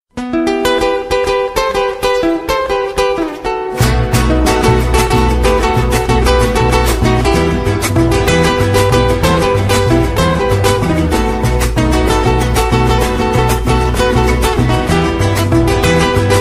guitar dance tango ringtone

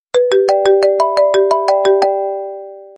apple msg ringtone

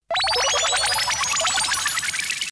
alert ringtone; sailor moon

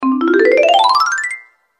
marimba sms ringtone

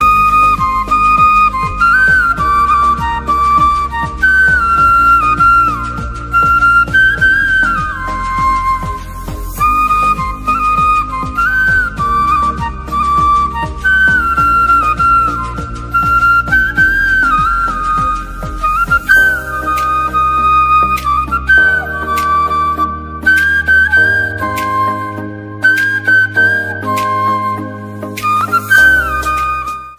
flute instrumental ringtone

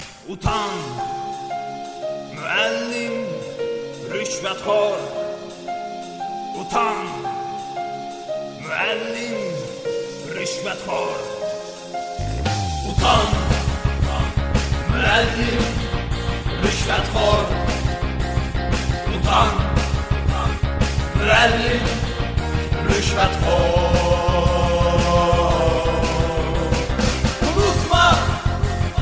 azeri ringtone

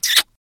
sms sound ringtone; kuss

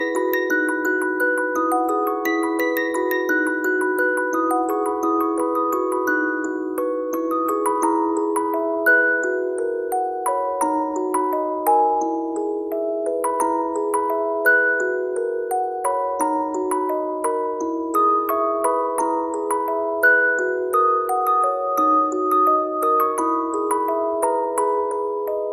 ringtone

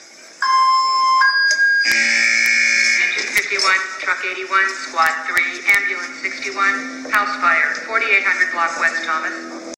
fire alarm ringtone; chicago

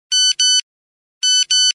nokia sms classic ringtone

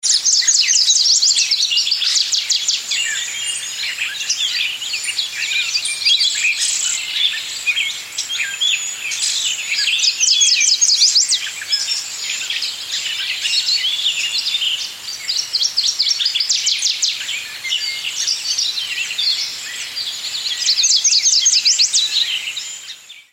forest day ringtone

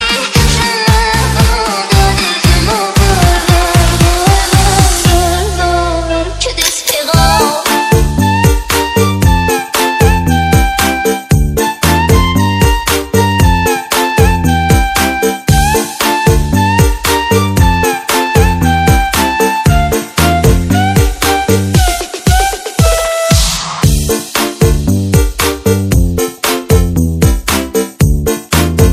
melody ringtone

